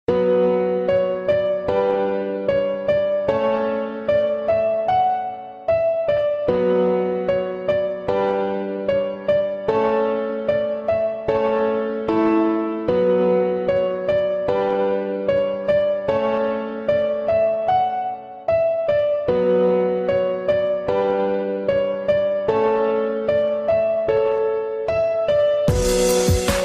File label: SAD LOVE PIANO